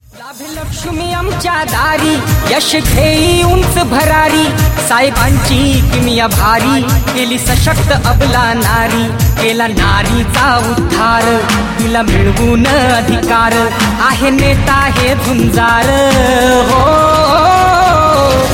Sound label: Song ringtone